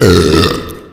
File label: combo break; Mastasz but refreshed